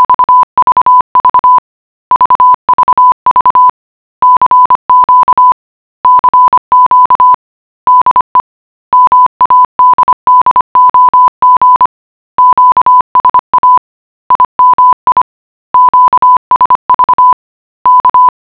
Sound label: morse